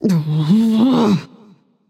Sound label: grumble; smite; voicepack; medusa